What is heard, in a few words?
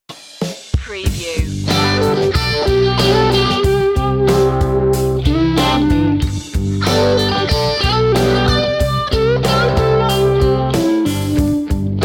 flower rock